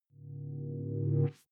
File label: sci-fi boot bell smooth